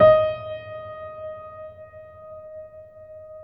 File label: PED MF, GRAND PED